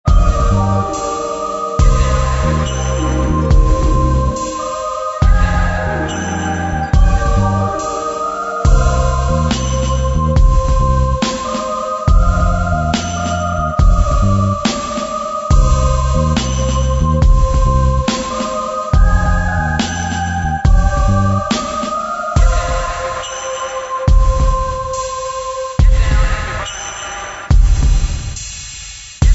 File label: ambient island